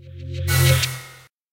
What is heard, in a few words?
alarm research; tech phase